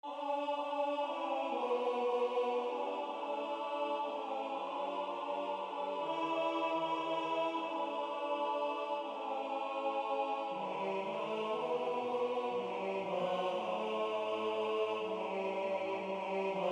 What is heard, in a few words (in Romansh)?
Sut steilas Tenor